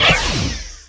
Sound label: blaster projectile; weapons